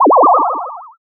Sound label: teleport